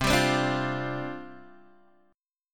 C Suspended 2nd Suspended 4th, down, Guitar, DADGAD